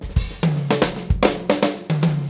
Fills 111; Zero-G; Monster Beats

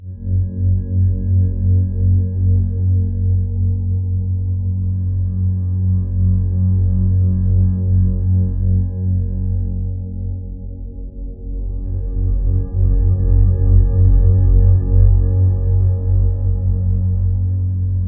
louder, low tones, ominous drones; USB Soundscan vol.48; AKAI

